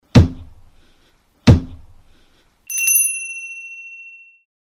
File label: cloche debut; Compétition Escrime artistique